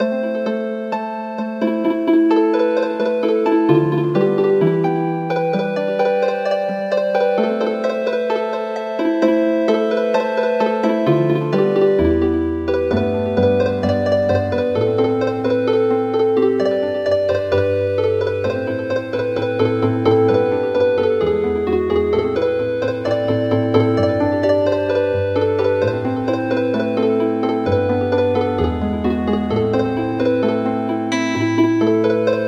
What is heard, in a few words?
Healin Harp